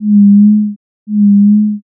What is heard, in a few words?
owl